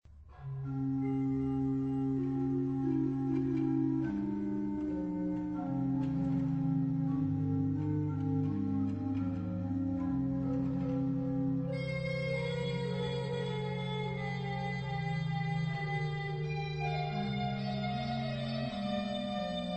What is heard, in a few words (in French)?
recit voix humaine, orgue; Nivers